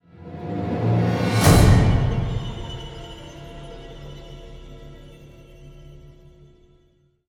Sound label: stinger